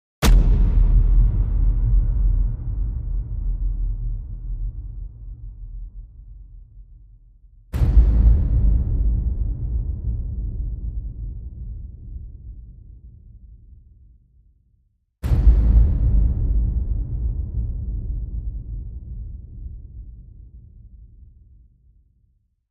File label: Low Drums